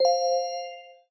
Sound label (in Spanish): campana; Steven Universe - Beach City Drifters